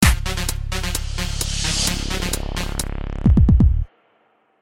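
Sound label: sounds with effect of completion, ending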